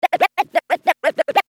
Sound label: scratch